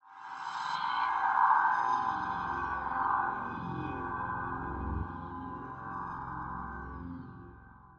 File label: Dark Synth, cinematic drama soundbeds; musicradar